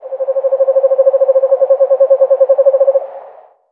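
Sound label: animal nocbird distant; Call of Duty Modern Warfare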